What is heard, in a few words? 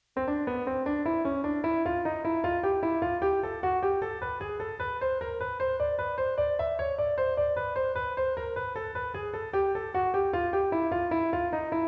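Major Scale Tone Embellishment, jazz piano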